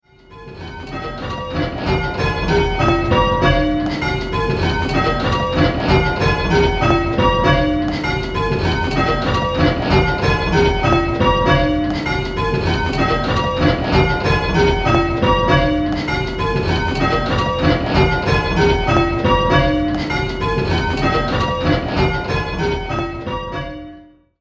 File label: 6-Bell; Who's leading; Which Bell is Leading?